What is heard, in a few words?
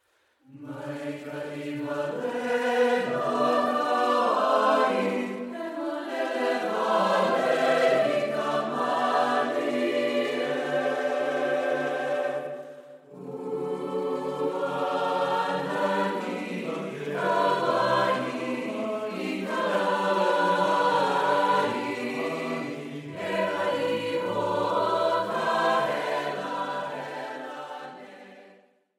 choir